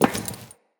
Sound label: Walk Metal, Footsteps; Enemy; Characters; Lady Deora